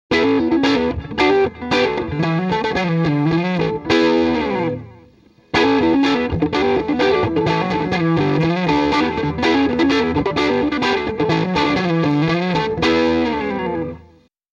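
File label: m75 lp neck pickup light od jtm45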